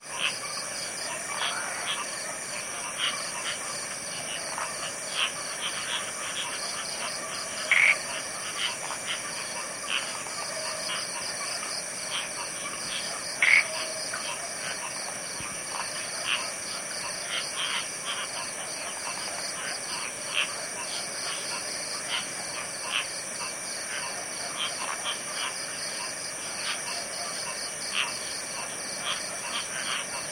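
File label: atmospheric sounds Bali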